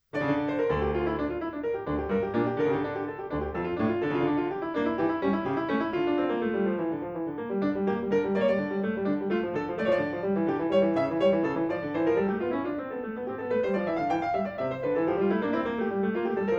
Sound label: Bach Prelude